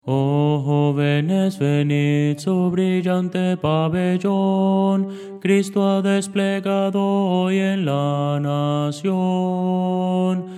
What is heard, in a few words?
tenor; oh jovenes venid